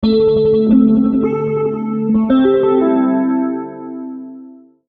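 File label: Musical Element, Drum Snare March; Blastwave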